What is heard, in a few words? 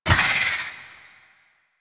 Fairy Soul Pickup; DE; Warframe